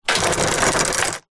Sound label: chain medium drag over metal hoist; industrial; zapsplat